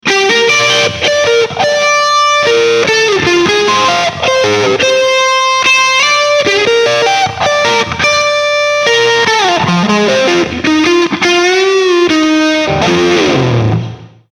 Eleven Rack Plexiglas

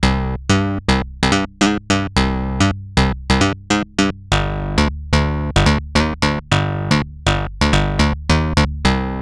DX-7 Bass; Super Gemini

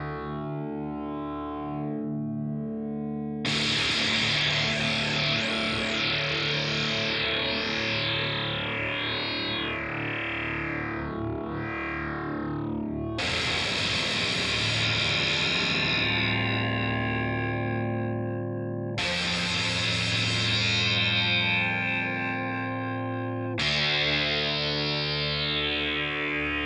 Source Audio Ultrawave SA 250

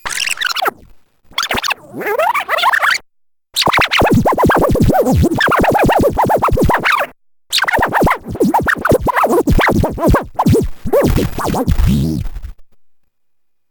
Scrubbing; Assimil8tor

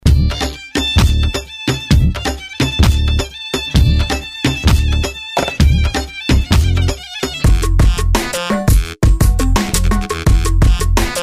Oriental RnB

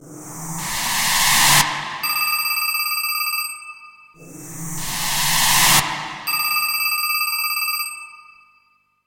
SFX Stop Bell

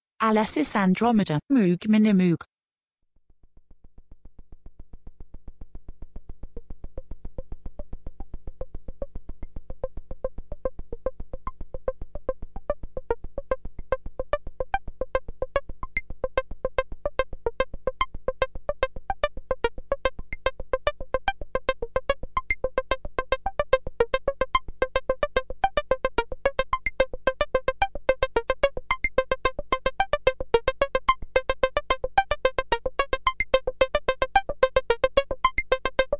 A6 Minimoog Env

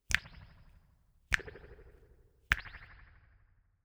Snap 3 Springs HP Resonance; U-He Twangstroem

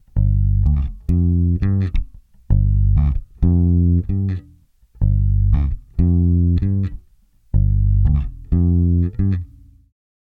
Channel E Bass; Heritage Audio

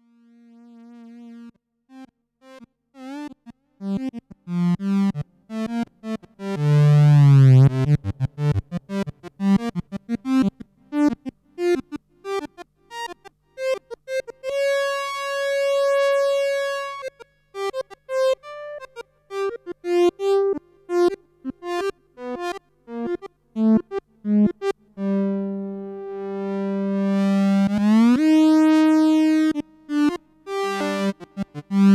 cs40m snappy Lead

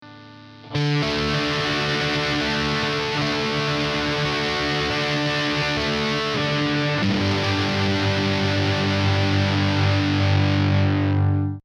Steinberg UR816C Drive

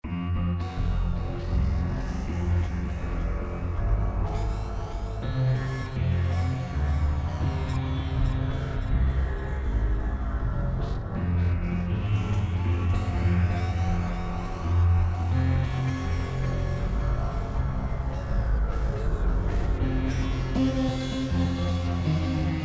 Arp

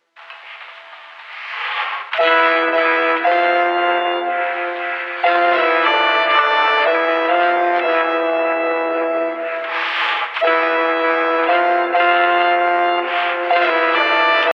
clean melodica

